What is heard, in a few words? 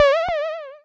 tuiiim (jump), atari